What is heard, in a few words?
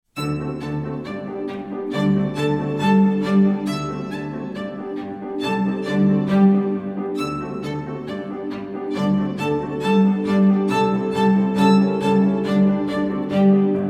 Harmonic Czardas, Everything String